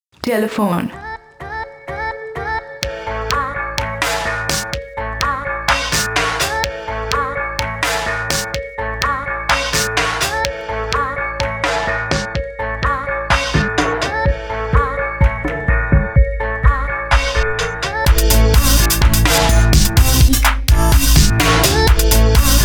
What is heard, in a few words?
RINGTONE